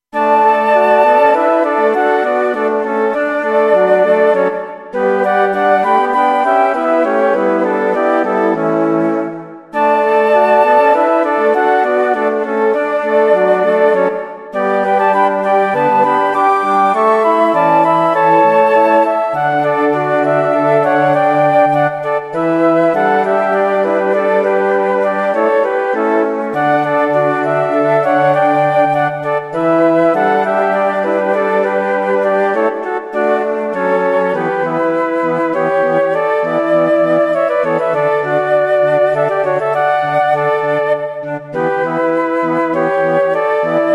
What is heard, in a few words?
Flute Quartets